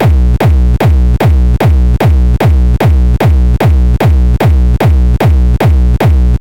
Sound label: Hardstyle Kick